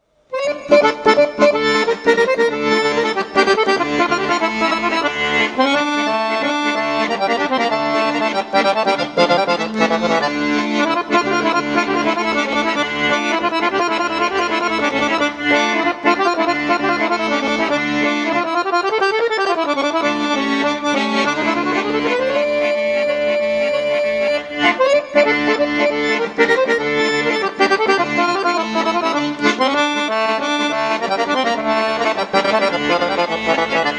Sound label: Accordion Fast Italian track